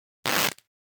Cloth Split Rip Tear - Quick - Foley Cloth Movement; Hollywood Edge; sound designer's toolkit v2